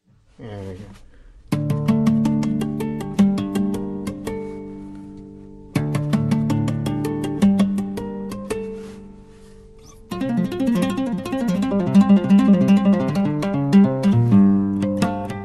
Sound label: Solo Only